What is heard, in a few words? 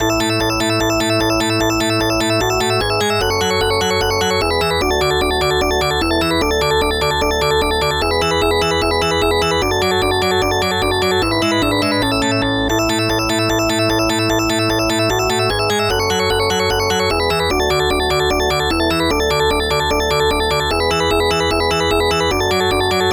Bonus